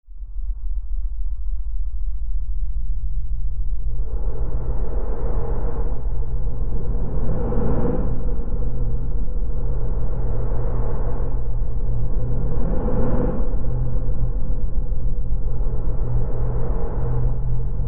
dark; dark-ambient

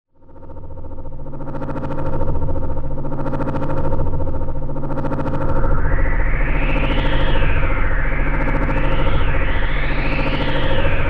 Windy Hallway, dark ambient; The Fall - Soundtrack

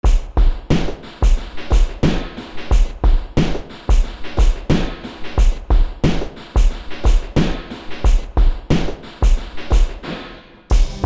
triphop; dark-ambient

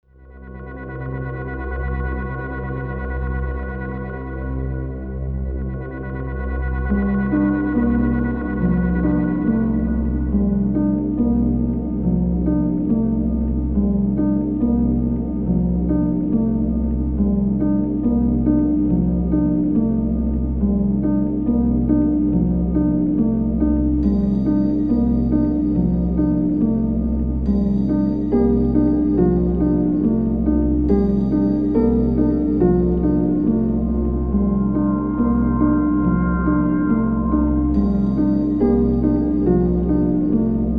melody; dark-ambient

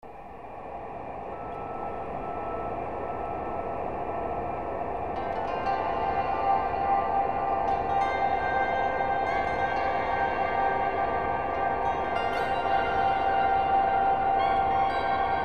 strange, dark ambient

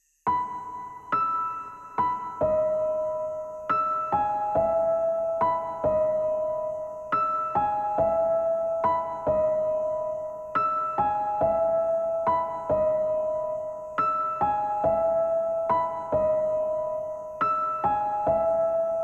After Rain, dark ambient

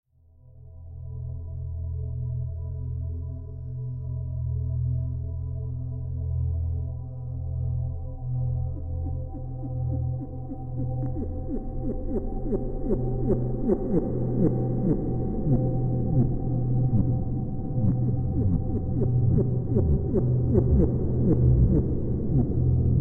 melody, dark ambient

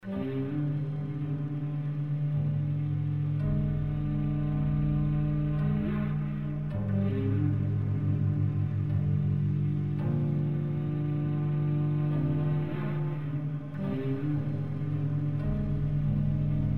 Melody

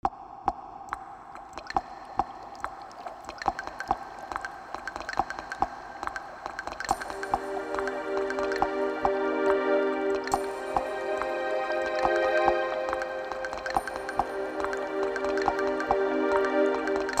amb, dark ambient

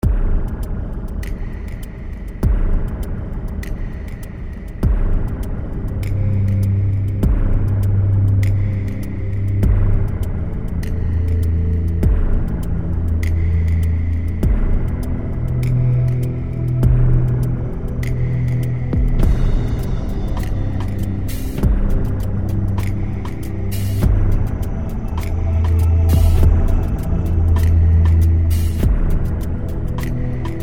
Rain, dark ambient

